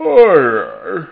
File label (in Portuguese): bocejo; 7invasores